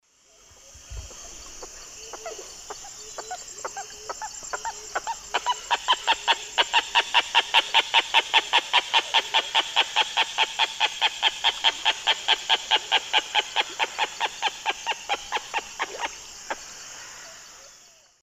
Jacana jacana, calls; S. America; Eastern Lowlands

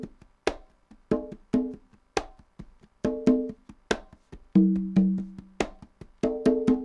congas 140, latin, 140bpm; Sound Effect Library

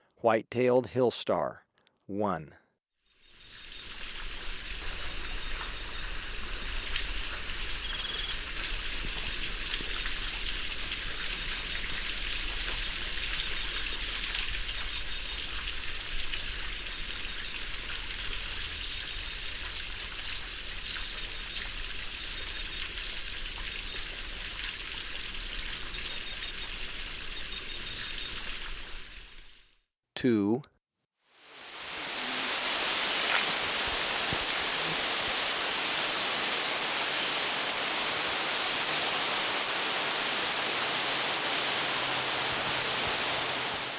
White-tailed Hillstar; S. America

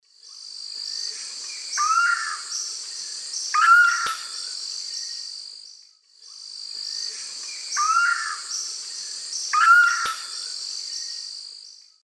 Lipaugus vociferans, call; S. America; Eastern Lowlands

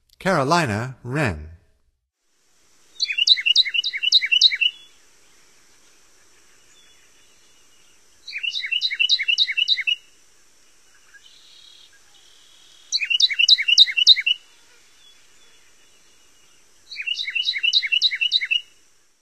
Carolina Wren, Songs of Birds of North America; Stokes

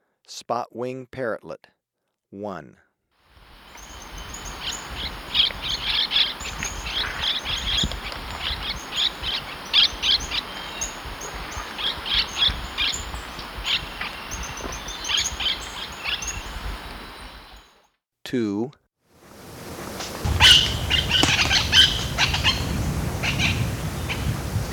Spot-Winged Parrotlet, Birds of Ecuador; S. America

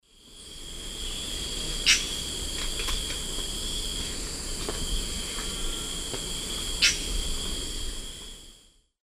Campylorhynchus turdinus, contact calls; S. America; Eastern Lowlands

